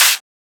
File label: Open Hat; FLPRO